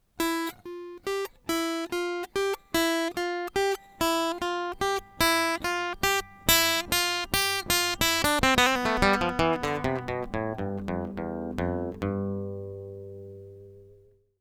Plucking Point Estimation Example Input

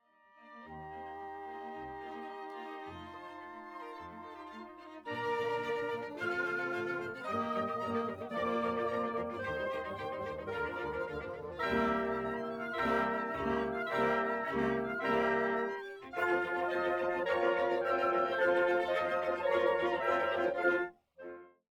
Zoom anechoic Orchestra

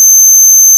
moog

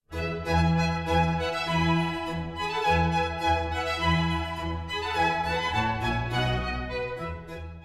vivaldi coarse AM coarse FM

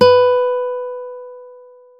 fortissimo

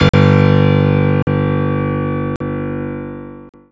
piano

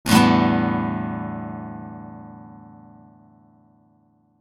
rasgueado speed scale synthesis

